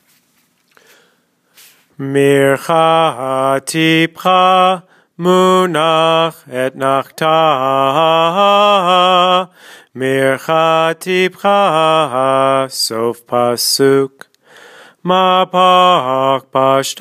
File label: Haftara trope